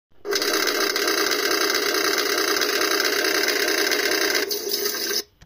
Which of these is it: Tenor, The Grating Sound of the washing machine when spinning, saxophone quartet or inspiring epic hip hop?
The Grating Sound of the washing machine when spinning